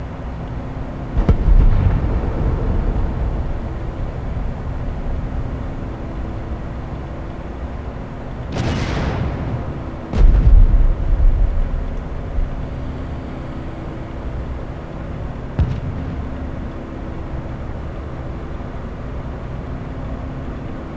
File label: ambi air; Terminator 3 Rise Of The Machines; XBOX